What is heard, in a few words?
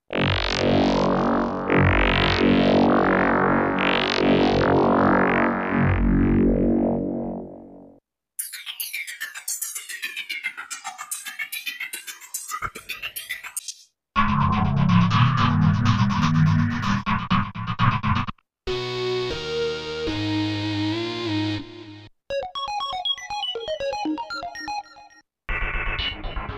Synth SFX, Triton; Triton Extreme; Pro-Rec